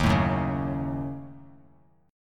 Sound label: Eb Minor 7th Flat 5th, Bass Standard; strummed